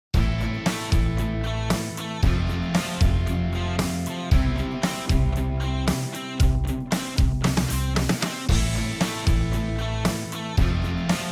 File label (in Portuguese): harmonia VIm IV I